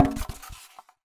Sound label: Polystyrene Rattle